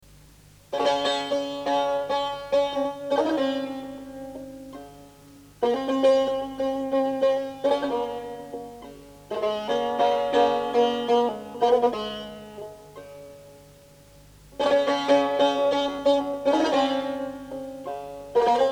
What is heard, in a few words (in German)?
Instrumentales Zwischenspiel